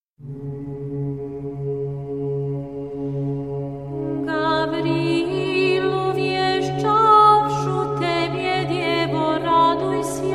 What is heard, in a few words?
orthodox serbian choir; Rozhdestvo Tvoe